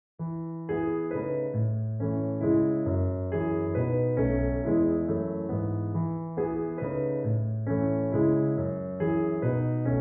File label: Solo Piano